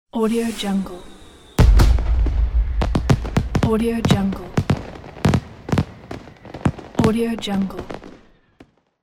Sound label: fireworks sound